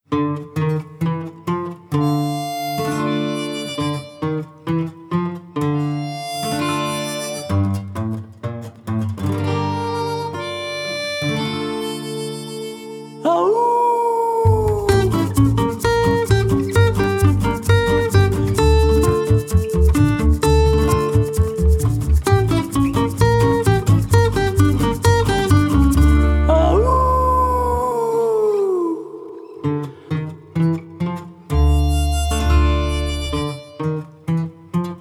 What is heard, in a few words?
Coyote Howl Instrumental